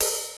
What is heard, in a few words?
LIVHATOP, MPC-60 DRUM2; Greytsounds VOL-1